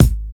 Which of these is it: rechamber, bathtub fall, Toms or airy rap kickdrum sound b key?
airy rap kickdrum sound b key